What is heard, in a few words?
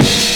amen break kick